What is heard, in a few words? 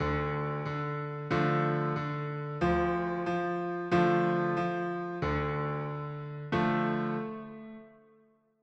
b stronger pulse minor push